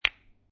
Billiard Pool Snooker Two Pool Balls Clacking Together Slight Rattle; Billiard,Pool,Snooker - Two pool balls clacking together with slight rattle